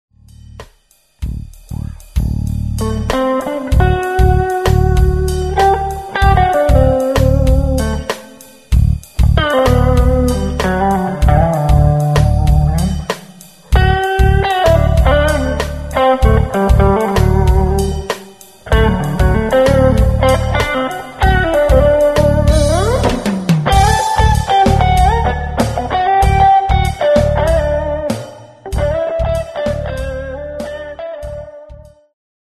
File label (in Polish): miksolidyjska impro